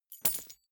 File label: key ring large drop carpet soft; objekte; schluessel